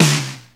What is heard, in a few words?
RX5 SNR; Hollywood Edge; The Big Bad Beatmachine